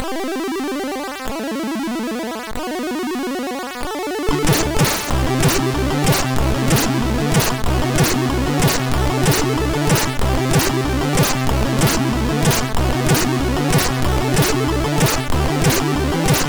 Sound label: sid MOS6581R2; 99 Procent; Dexter